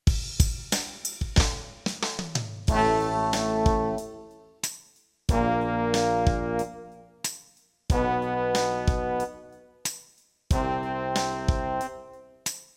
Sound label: Find in Bb, brass